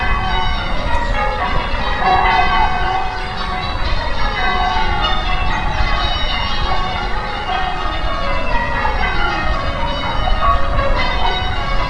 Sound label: bells